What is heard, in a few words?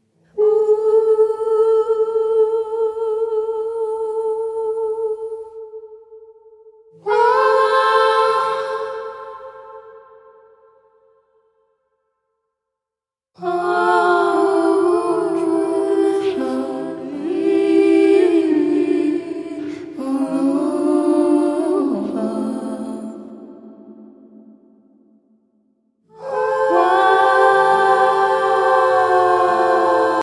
SPOOKY CHORAL VOCAL